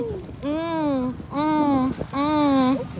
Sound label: mmmmm mmm